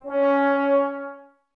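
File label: brass trombones C#, Woodwind Brass Ensemble; 90 s Sample CDs; Miroslav Vitous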